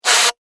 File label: Pneumatic Release, Scifi; Science, Fiction; Spacecrafts; BlastWave